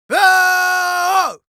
Yells, Viking Vocal

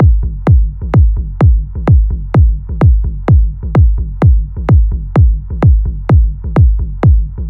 Drum Loop Kick 128, Melodic Techno; Unleash

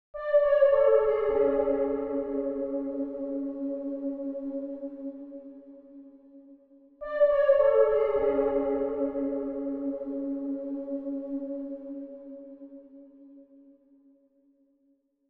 140 BPM F# Min Synth Lead; Trap Melody Loops; Loop Stems; Cymatics

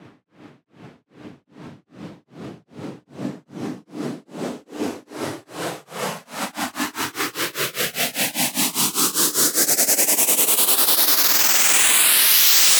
Riser; SFX; Dubstep; Ghosthack; Dubstep Sound Arsenal

